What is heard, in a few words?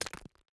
environment pickaxe hit stone